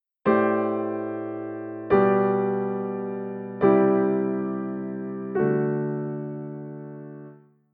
ii V G major

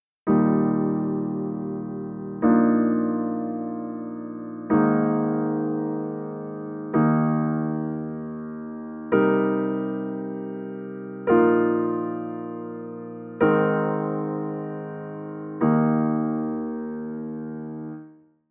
static soprano

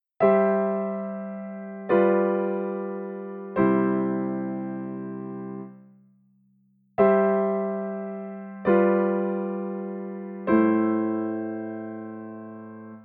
The deceptive cadence

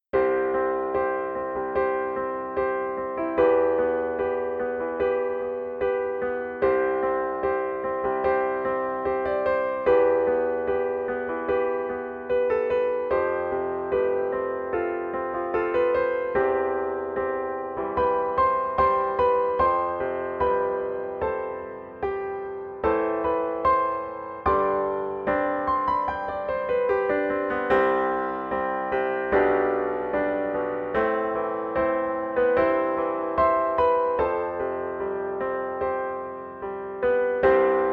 solo

